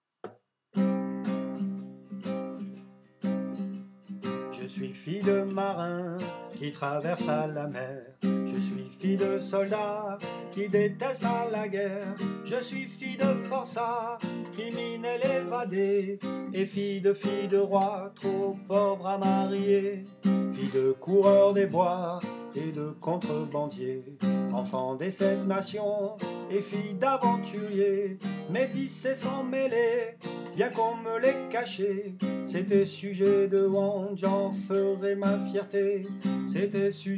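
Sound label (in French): Voix Aigue, chorale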